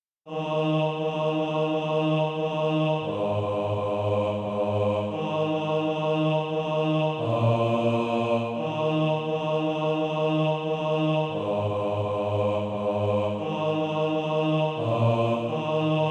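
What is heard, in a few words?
bass; SATB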